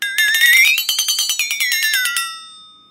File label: flexatone